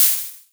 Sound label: syringe gun reload air; tf2